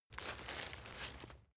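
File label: step leaves wet sneak, footsteps; schritte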